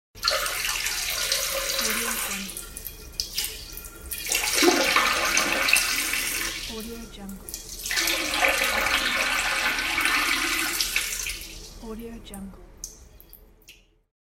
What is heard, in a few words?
wc toilet pee